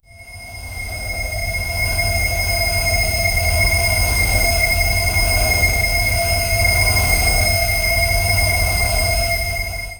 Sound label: the sound of a crystal, creation